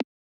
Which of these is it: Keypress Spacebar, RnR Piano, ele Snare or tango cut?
Keypress Spacebar